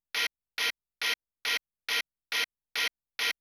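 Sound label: open hi-hat GATE, Techno 138 BPM; Best Service ProSamples vol.54; AKAI; HELLS DOOR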